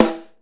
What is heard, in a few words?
Dry Snare Drum Sample E Key, Royality free steel snare drum sound tuned to the E note